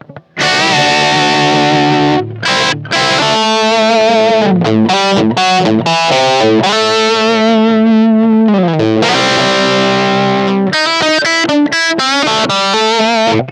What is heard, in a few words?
gk3 p7 buffer vs p7 buffer